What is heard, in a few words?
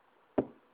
Pool Ball Bounce Off Rail